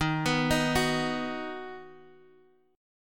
D sharp Minor Sharp 5th D sharp down Guitar Half Step Down; D#m#5